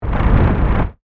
Whoosh Warble, Bubble; Whooshes; BlastWave

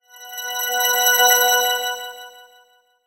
Shimmer Marimba Delay Ring High; Mallet; Blastwave

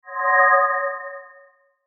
SHIMMER RING; Shimmer Ring Reverb; High Tone

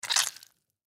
Human Zit Pop, Zit Pop Squish Squeeze; Objects; Blastwave; Humans

